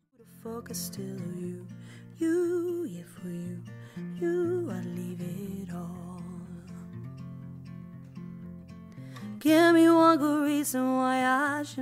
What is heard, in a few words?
Acoustic Duo Cover